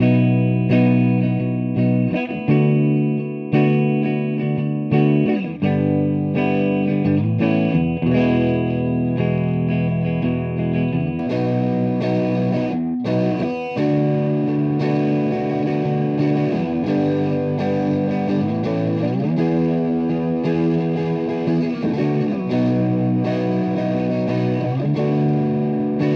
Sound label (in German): Verzerrer Pedale Neutral; HB Vintage